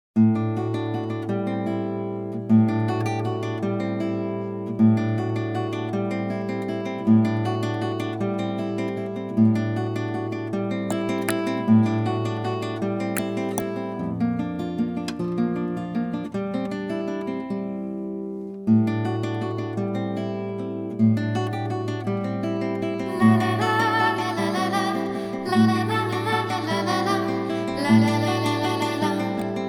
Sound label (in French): accompagnement seul